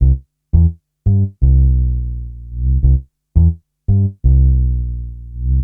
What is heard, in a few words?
Upright Bass, Drum & Bass; Fluid Dynamics